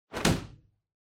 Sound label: Motion Slam Strong